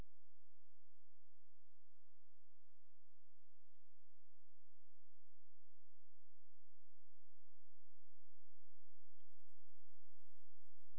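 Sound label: hydrophone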